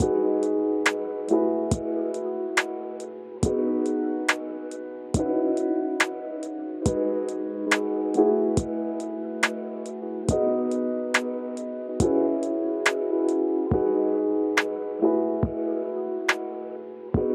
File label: Chill Lofi Beat 70bpm